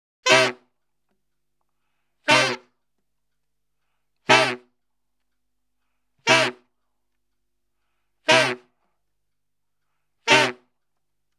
Salsa Picante; Masterbits; World Zone VOL-3

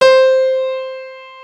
U-20 PIANO; Rubber Chicken Software Sample Bank

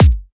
kick layer

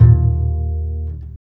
TRICKS, DBASS; Univers Sons; Jazzistic

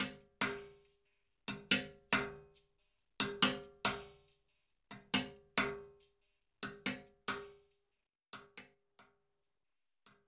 METAL; Spectrasonics; Liquid Grooves 1 & 2; BUBBLY

